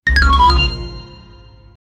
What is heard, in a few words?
futuristic sci fi sound o